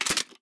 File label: m60; svencoop; ins2